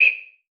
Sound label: Beep Low Pitch; Multimedia Internet CD-Rom Flash